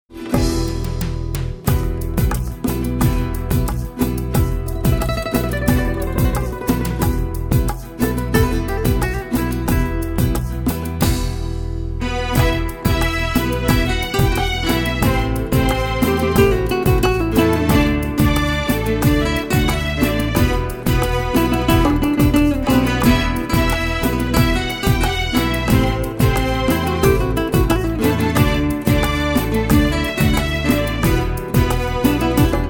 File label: rumba romance